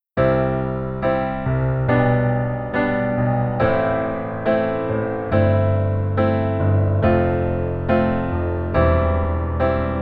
Keyboard Chord

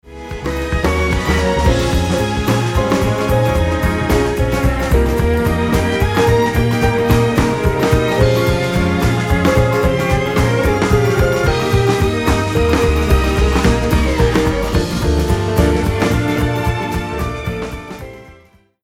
Strings Dual All

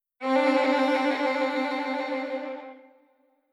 trill

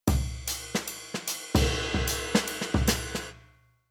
Rhythm Modern Jazz

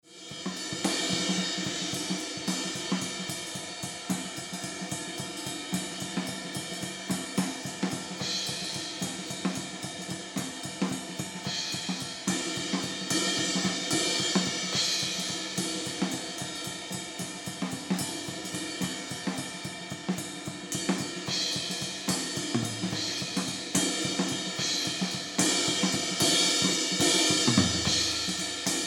Drums HH Ride Ride

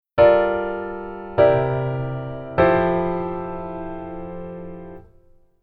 Keyboard Voicing Hi

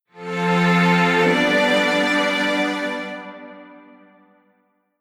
Strings; Wasei Kinsoku Heitatsu5 NG

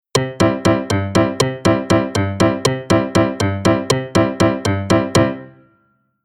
Rhythm

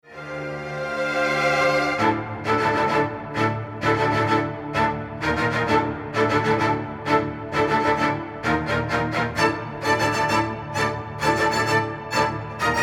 Strings Kizami Strings Only

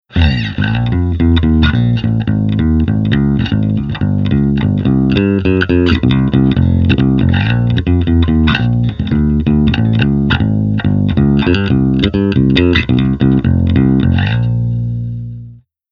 Bass Amp Sim Cabi On B

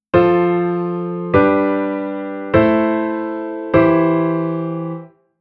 Chord

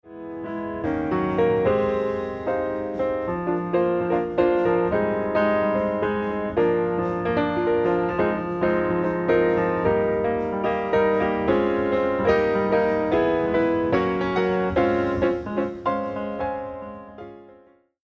Piano Hall On

